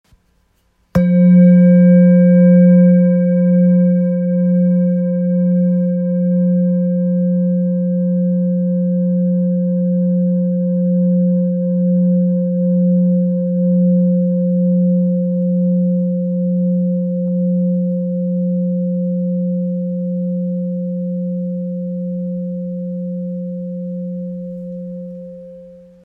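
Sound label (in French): Bol therapeute do, bols chantants tibétains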